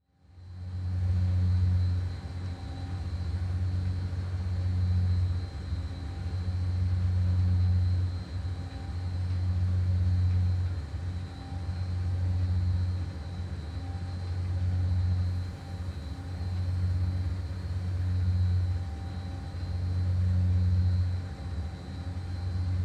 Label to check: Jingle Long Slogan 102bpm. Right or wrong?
Wrong